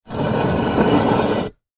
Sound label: Rock Slate Movement, Rock Slate Movement Slide; Foley Rock Slate Movement Slide Cinder Block; Blastwave